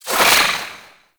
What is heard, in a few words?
Bow Whizz By; Warframe; Corpus